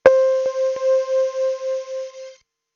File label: twinkle echo